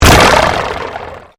Convolution Pulse

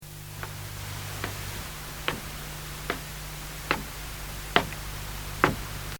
Footstep stone, 1 man departs; Part of Footsteps (Sound Effects:Human); BBC

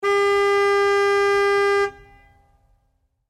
Truck GMC Savana Horn Long; 2010 GMC Savana; Trucks; Vehicles

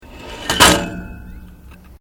Flying fox Terminal Impact, playground equipment; Sports

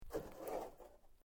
Metal Sheet Move; Material components to create more complex sounds; Materials

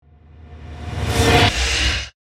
Whoosh Transition, Suction, Accent, Transition; Production Element, Imaging Element; BlastWave

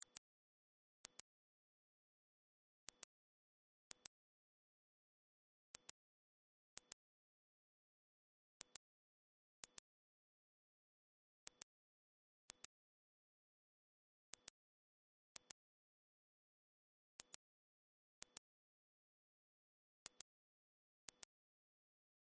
Science Fiction Computer Beeps Loop; Computers

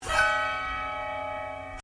ZITHER STRUM, Very old wooden zither or autoharp; Strings; Musical

